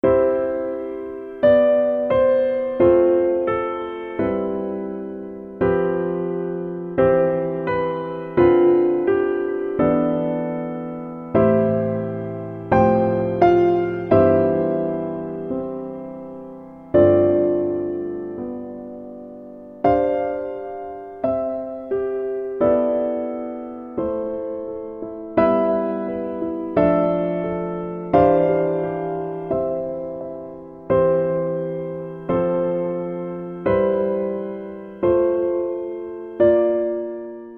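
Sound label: Fairest Lord Jesus, Piano Low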